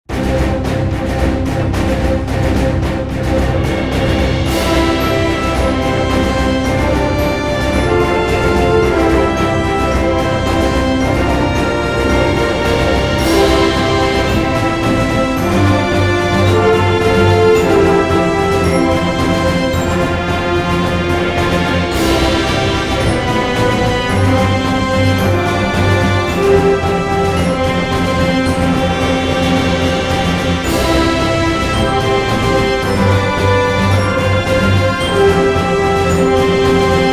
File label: Fanfare